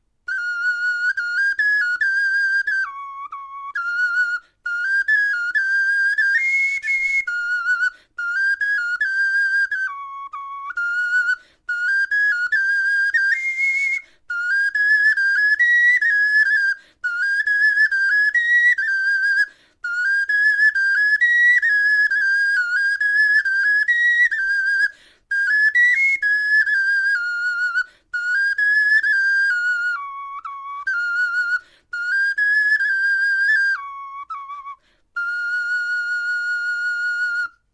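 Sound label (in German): melodie, Knochenflöten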